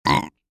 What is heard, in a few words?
Goblin funny burp